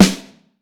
Vintage Snare Drum, 6.5X15; Q Up Arts; Johnny.C's Vintage Snare Drum; 6.5X15 LUD

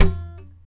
TAP, FLAMENCO Guitars

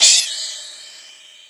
RSS, 160 BPM

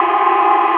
SIRENS; PlatinumPhattCD1; E-MU Producer Series Vol. 8 – Platinum Phatt; Mo Phatt Instrmts

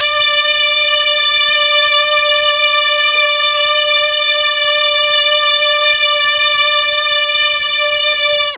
D3 16 VIOLIN, Mellotron; TRON 16VLNS; Mike Pinder - Mellotron Archive CD

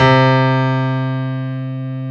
C2 piano; AKAI S-Series CD-ROM Sound Library VOL-8

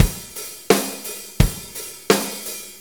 4-4 Loop, Drum Loops, Full Kit Loops; Sonic Foundry (Sony Creative Software); Loops & Samples Drum Tools

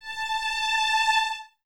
Violins Detache A 5; Miroslav Vitous; 11 Violins

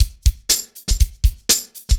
120 ROCK, DRUMS

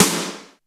SNR REV; SNARES; Back In Time Records - Big Bang

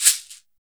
ETHNO SHAKE; FILM KIT; Drums In Your FACE!

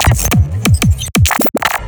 Glitch

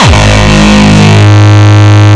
Longkick, kicks

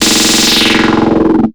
filter roll; Zero-G; Total Drum Bass; Hits and Bits

